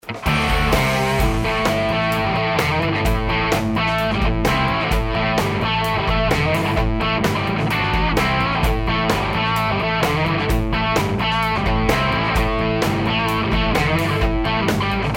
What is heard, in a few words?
higain bridge, Tele; schwarzbach; Tele60s